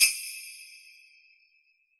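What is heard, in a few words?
hip hop kit bells reverb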